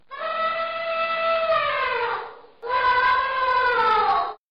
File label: elephant effect